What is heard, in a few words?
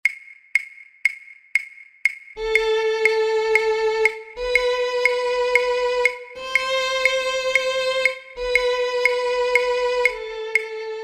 violin 1; Regular speed; online orchestra